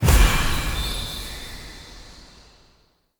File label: Acorn Success; Quick Time Events